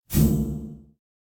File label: Metallic Impact Dull Machine Turn On Beep; Hollywood Edge; sound designer's toolkit v2